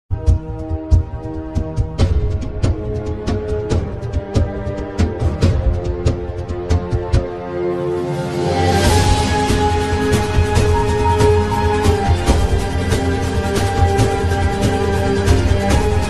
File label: attitude fight song mobile ringtone; kurulus osman season 5